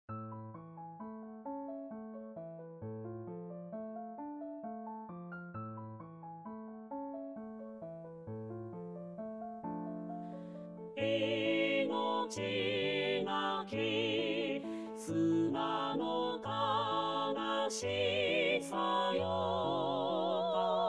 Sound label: inotinaki tenor all; 石川啄木